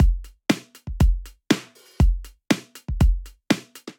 Altered Kit, Drum Kits; Ableton; Drum Booth

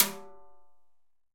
Timb H Cascara, Latin Percussion; Drums; Drum Hits; Timbales; Ableton; Factory Packs

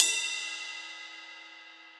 Ride Bell Studio Basic; Drums; Drum Hits; Standard Hits; Ableton; Session Drums Studio

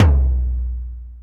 Tom Low DMX; Drum Machines; Drum Hits

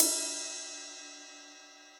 Ride Multirod Mid Dry Acoustic; Drums; Drum Hits; Standard Hits; Ableton; Session Drums Studio

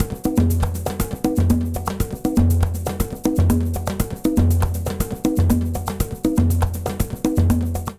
Candombe A-120bpm, Latin Percussion; Ableton; Factory Packs

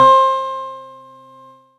Chime In; Ableton; Factory Packs; Retro Synths; P-50 Linear; P-50 Structures; P-50 Partials

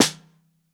Bottom, Snare

